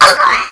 boosterzombie hurt; zmall

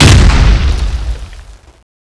rocket pistol exp; zmall; zm boost; privilege; mboss

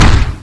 step, scorpion

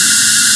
water cannon shoot, weapons; zmall

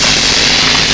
rocket pistol; zmall; zm boost; privilege; mboss

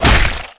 claw stab; zmall

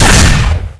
link gun shoot; weapons

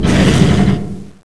guard broken, scorpion

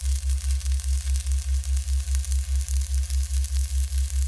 turret spark loop; zmall

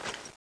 crossbow zoom out, weapons; zmall

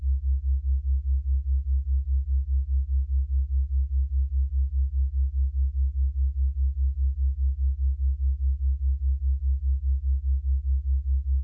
turret idle; zmall

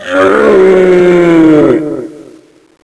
die; zmall

